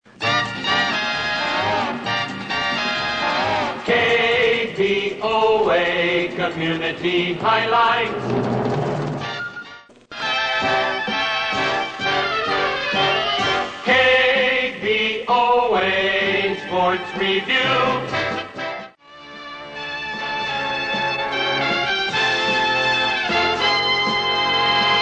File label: jingles